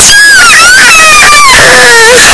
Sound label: pikachu cry; pokeyellow